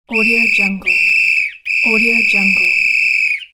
whistle referee

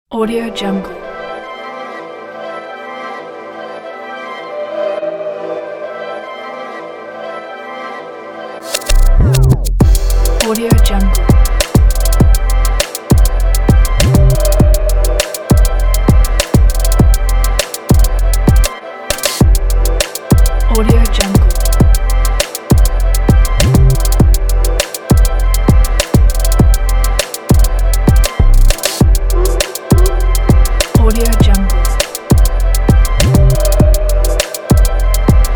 creative trap beat; anton 1988

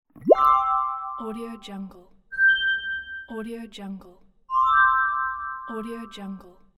soft bell pop ups

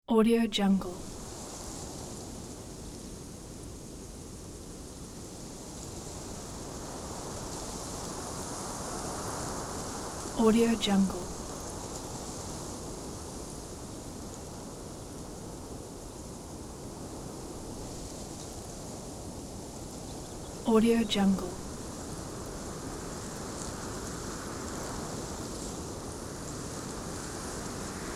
light breeze

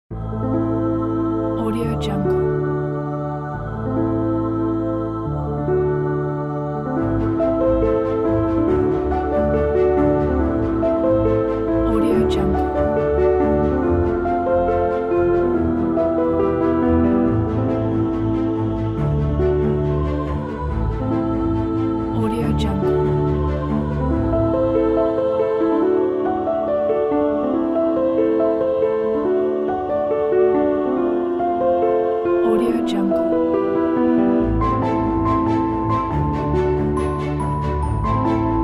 magic cinematic

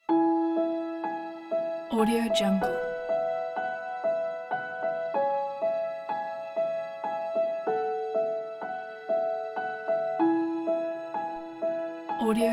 sentimental orchestral

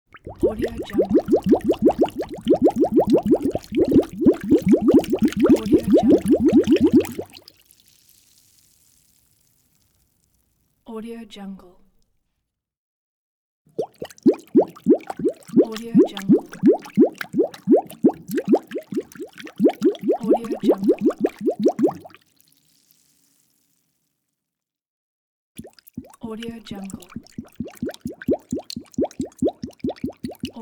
slow bubbling liquid

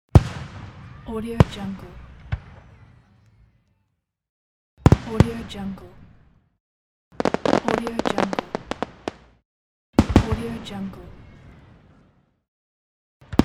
fireworks pack; pure-noise

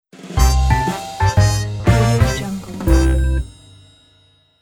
jazz intro cartoon sound

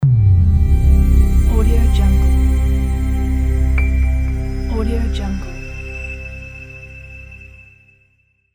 clean minimal logo; hikari-pictures

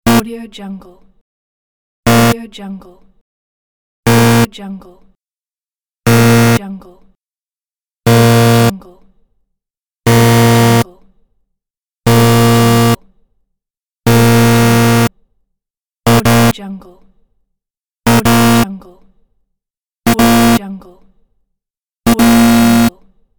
buzzer

